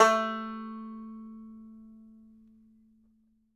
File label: banjo A3, banjo notes; Sampleheads - Whole Lotta Country 1 & 2